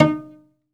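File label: SOLO CELLO, Synclavier Strings; ILIO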